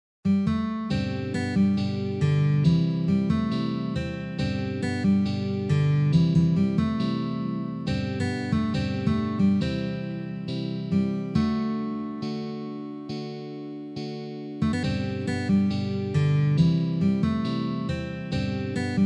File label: Fast Tempo